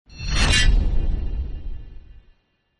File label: SWORD EFFECTS